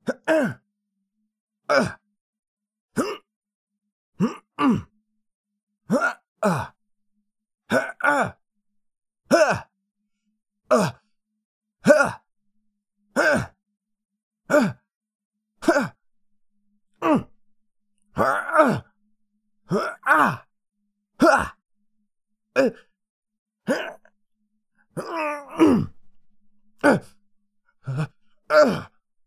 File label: victim - grunting